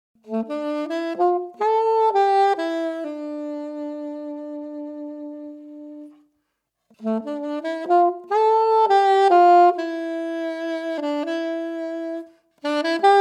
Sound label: Modern Yamaha YAS62 solo, Es-Alt-Saxophon